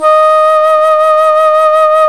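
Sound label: Alto Flute vibrato; FLT; A.Flt vib 2; Roland L-CDX-03 Disk 1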